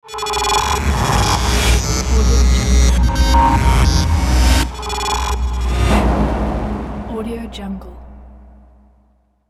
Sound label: glitch logo